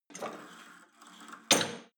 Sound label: cable machine pull and drop full, Fitnessstudio; sport freizeit